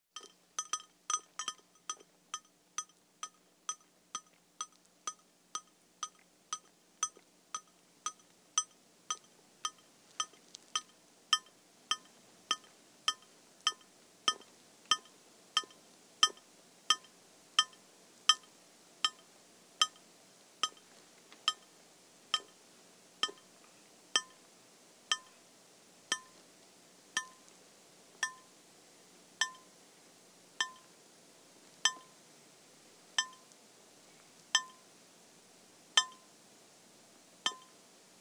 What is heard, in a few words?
Water dripping into a metal sink